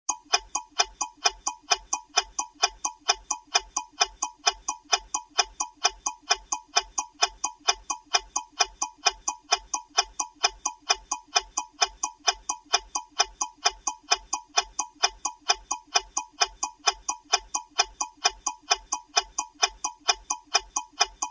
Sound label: huey timer